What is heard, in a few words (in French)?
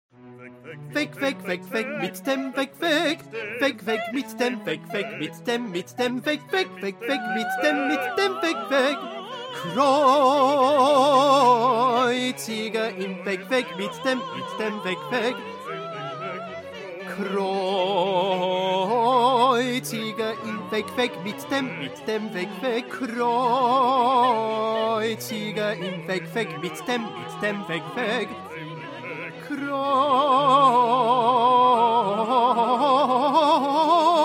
petit choeur tenors